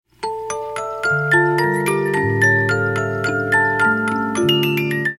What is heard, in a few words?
Harbour Habu, 50-Note Movements